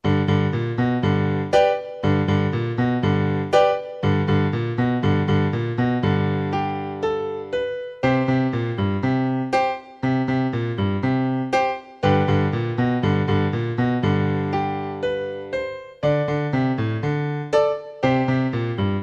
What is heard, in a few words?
Hard Driving Blues